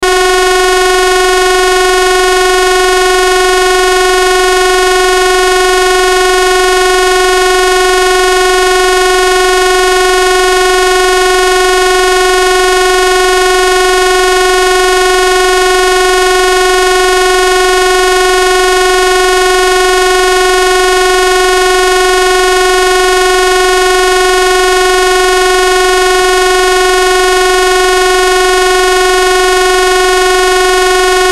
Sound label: Noise Static High Interval Hum; Blastwave